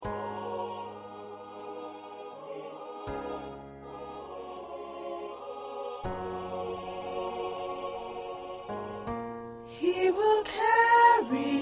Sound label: Choir Soprano